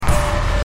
unknown suspense sound

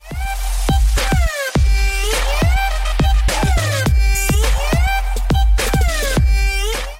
alert sound

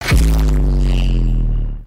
boom boom

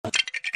bone dropped

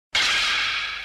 sudden alert; one piece

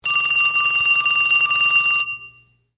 telephone ring; spongebob

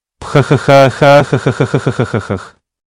ahhahahah

